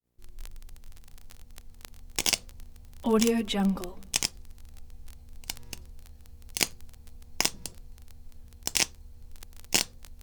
welding zaps

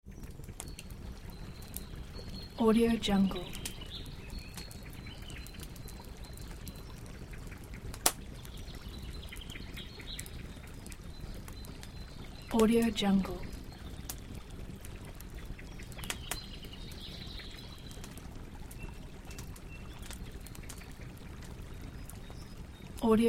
forest campfire ambience

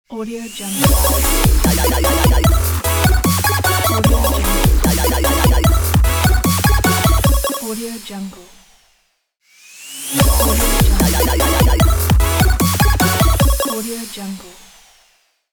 8bit dubstep logo

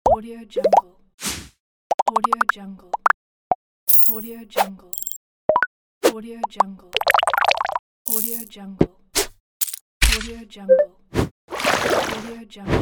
infographics video sound effects pack

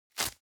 foley velcro open; smartsoundfx

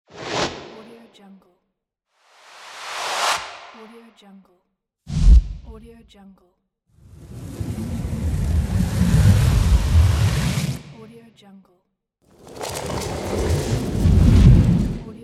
whoosh pack; 50 whoosh sfx pack

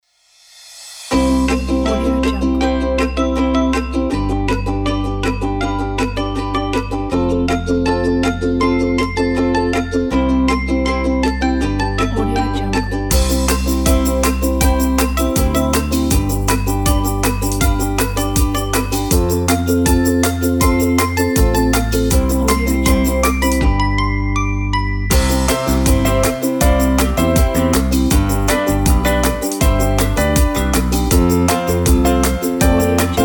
happy kids melody

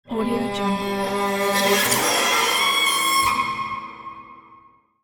cello transformation

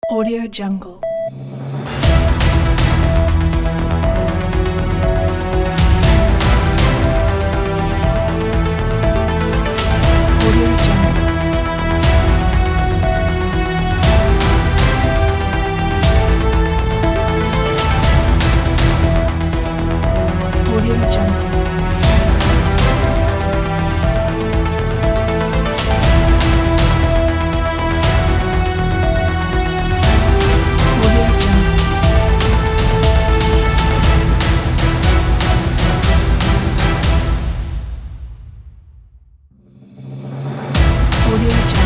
breaking military daily news

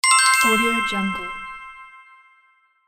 sparkle swell

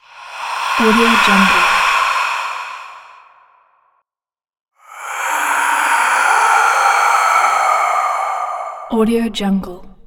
ghost

